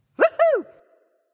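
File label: yay, female; smite; voicepack; hera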